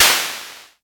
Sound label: crash cymbal d key, Royality free drum crash sample tuned to the D note. Loudest frequency: 5415Hz; one shot